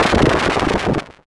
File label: explosion crunch; kenney; sci-fi sounds